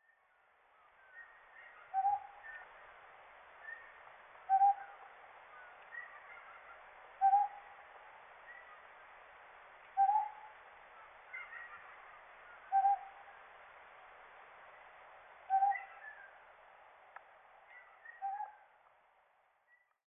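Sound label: Ryukyu Scops Owl; ishigaki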